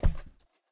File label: Box Hit; Game rip; MiSide